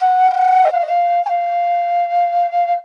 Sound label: NATIVE FLUTE, A